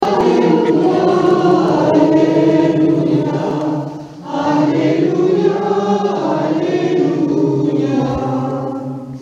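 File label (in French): EGLISE ALLELUIA; LA CHAPELLE POUILLOUX 79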